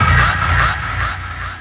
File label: Kaos FX, Ambient Grooves